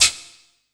PERC -L, 168 BPM Drum & Bass; Ueberschall; Drum & Bass Resonance

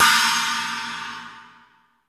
PANG CYMBAL; Crypton - DrumBase5000; CYMBALS

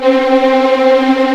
C4 TREM, VLNS TREMOLO, String; East West; The Ultimate String Collection

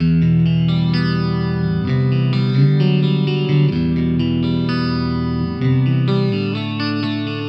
Electric Pop Guitar 128BPM Emin; Electric Pop Guitars; Vengeance - Essential Clubsounds Vol.4; Guitarloops

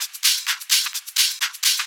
Hihat Loop 128 BPM

